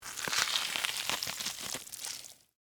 Tear Rip Watermelon, Texture, Tear, Rip, Watermelon, Flesh, Fruit; Ripping; BlastWave